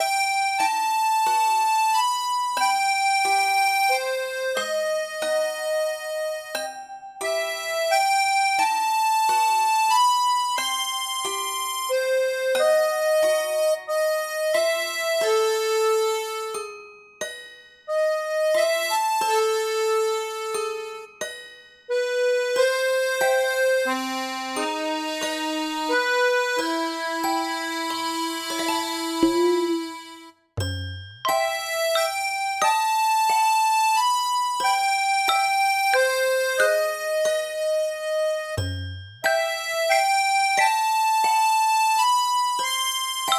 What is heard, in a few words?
Bgm Sad; toro to morimori; ps3